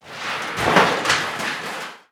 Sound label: explosive objects; World of Tanks